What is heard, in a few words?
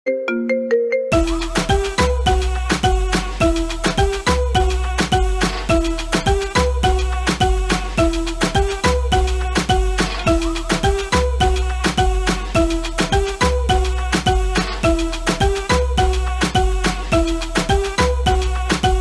apple xs and xiaomi mix ringtone